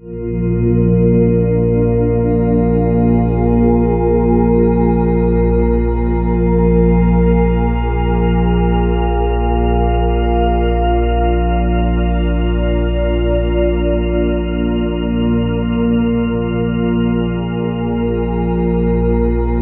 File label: BIG TUBE, ORGAN ST, Ominous Drones; USB Soundscan vol.48; AKAI